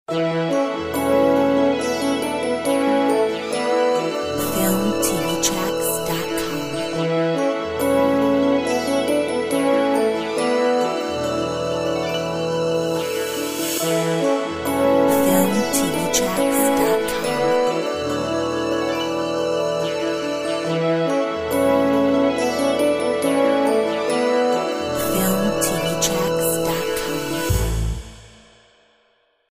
Christmas Stinger

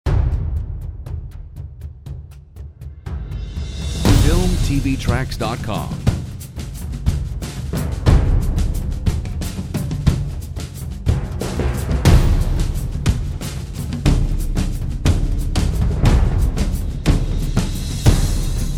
Royal Percussion

